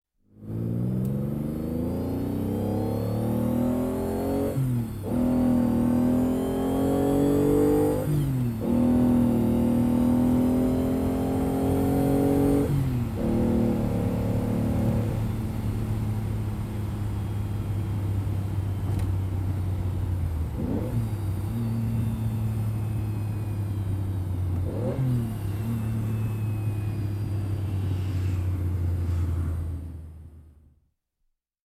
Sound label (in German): rennwagen - innengeräusch; Sport